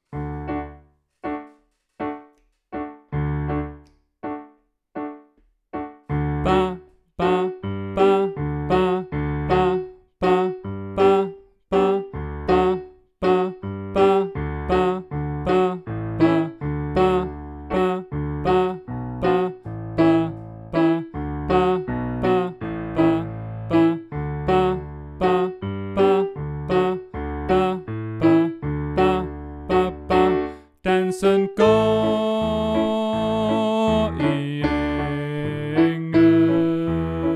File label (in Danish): Sopran